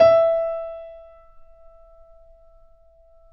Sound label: Mf E 2, Grand Piano off mic; AKAI S6000 CD-ROM - Volume 2